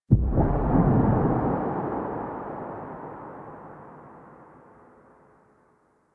Vitruvian Museum Enter; Warframe

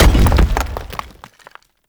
Blunt Heavy Impact Rock; Weapon Impacts; Player; Environment

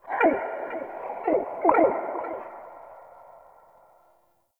Swamp Mid Creature; Ambience; Midrath Island

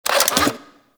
Necramech Repair Drone Claw Calibrate; Enemies; Warframe

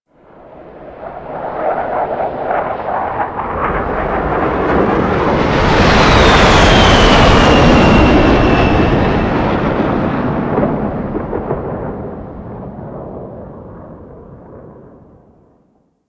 Paratrooper Plane Fly Over; Enemies; Soldiers; Warframe; Lasrian

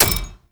Sticky Bomb Proj Land; Grineer; Veilbreaker

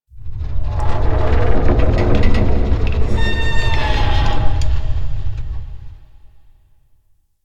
Asteroid Low Creaks; Ambience; Warframe; Grineer Asteroid

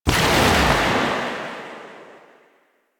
Shot Alt, Rifle; Weapons; Warframe; Thanotech; Trumna Prime

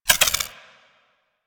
Freeze Ray Mech Chamber; Warframe; Weapons; Corpus

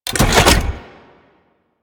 Missile Close; Arachnoid Camper; Warframe; Corpus

